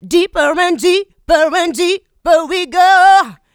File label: Female Vocals; Houseworx